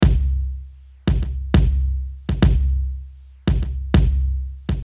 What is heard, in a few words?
Beat 100BPM Mix